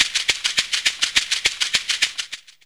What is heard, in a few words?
Cabasa